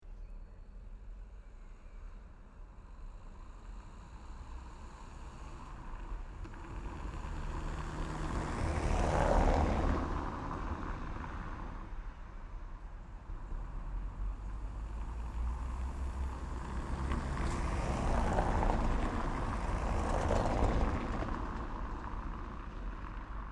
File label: Europe France Paris City Two Single Car Passbys Cobble Stone Road